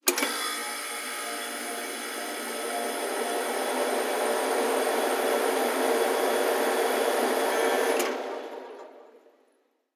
machine, ambient; nuke

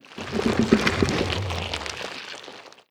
idle subtle, barnacle; Half Life Alyx

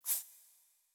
submenu slide in; ui

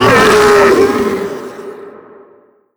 death, zombie vox; creatures

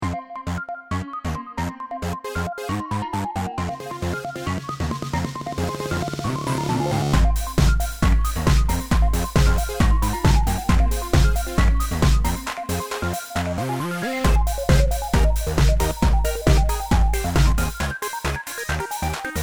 bomb planted

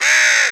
squawk pain, crow; critters

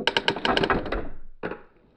house creak; ambient; inferno

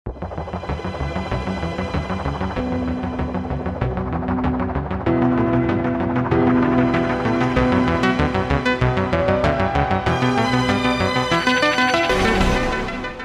round ten sec count, music

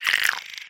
relax, clams; infestation

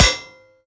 metal, bullet impacts; physics; Half Life Model Pack

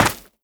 step run, footsteps, gravel; player

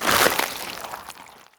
tear out, gland; creatures; headcrab classic

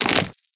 walk, antlion; creatures

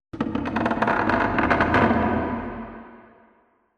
wood creak low; Half Life Alyx

